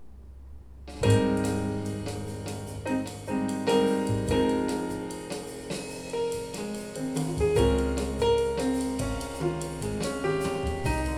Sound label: jazz